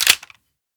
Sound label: sight, wpn faust